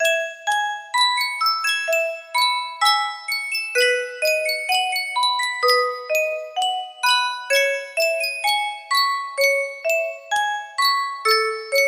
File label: Yunsheng custom tune music box